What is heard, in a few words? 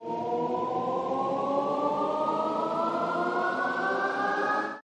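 respin sound; longpao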